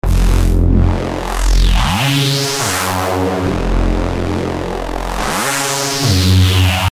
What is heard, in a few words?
140 F Synth Bass